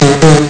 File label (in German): fat furz; Amiga Soundtracker Sample Packs